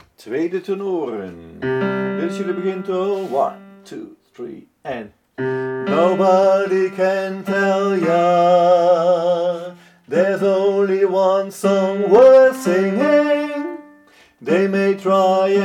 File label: tenor; oefenfiles tenoren 2